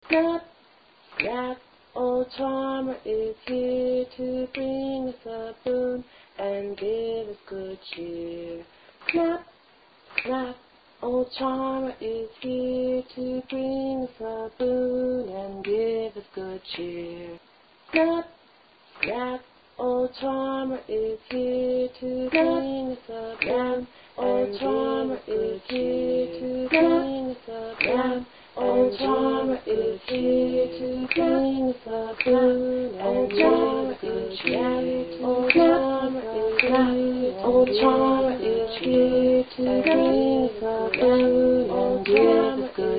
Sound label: chant